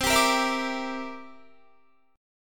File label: C 9th, Guitar; C9 strummed